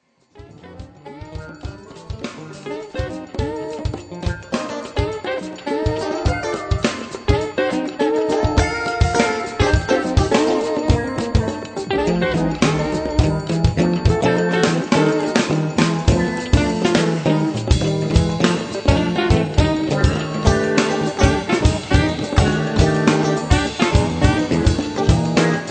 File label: Space Traffic